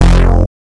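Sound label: Saw Lead Lower F Longer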